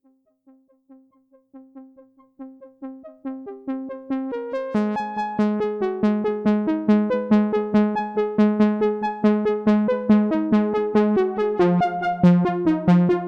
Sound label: Arturia MatrixBrute